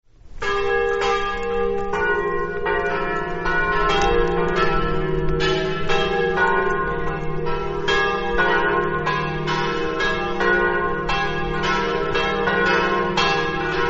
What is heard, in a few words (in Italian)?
torviscosa, campane